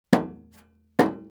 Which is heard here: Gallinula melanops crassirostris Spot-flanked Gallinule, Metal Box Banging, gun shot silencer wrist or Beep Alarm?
Metal Box Banging